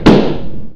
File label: balloon pop